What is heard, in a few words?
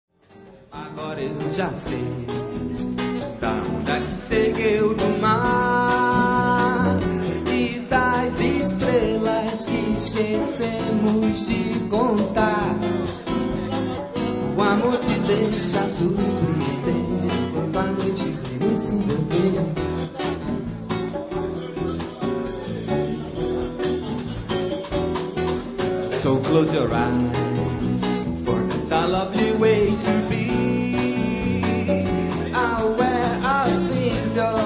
Samba Soul